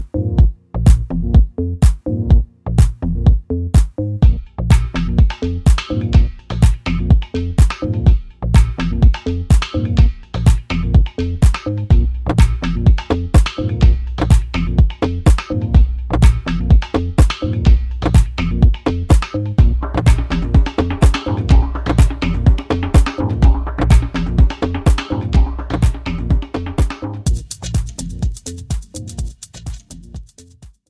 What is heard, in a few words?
Hard Drums Inst